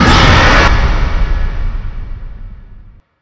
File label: fear attack